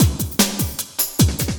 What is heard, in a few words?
LOOP, Drum & Bass, 150 BPM